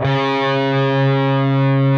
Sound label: PRS D, Guitars, LEAD